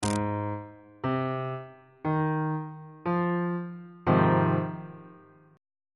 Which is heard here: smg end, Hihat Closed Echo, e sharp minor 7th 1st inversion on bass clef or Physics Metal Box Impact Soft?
e sharp minor 7th 1st inversion on bass clef